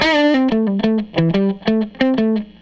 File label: Les Guitar Loop